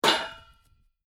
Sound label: Metal Impact Hit Metal Bar With Rock; Stone hit metal clank.; Metal Sound Effects